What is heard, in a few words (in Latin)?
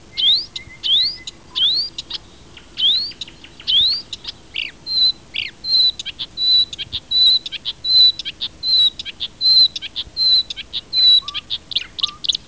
Acrocephalus palustris; Камышевка болотная